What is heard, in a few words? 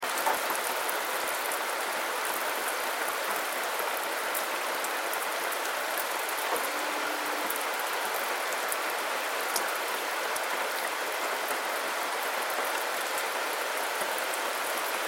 rainfall